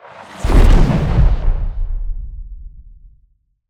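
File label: explosion norm; weapons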